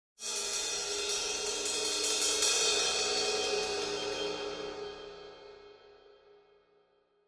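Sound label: Cymbal Single Crescendo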